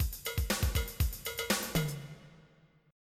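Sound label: blues rock; Software Explosion 2 - Virtual Computer Pets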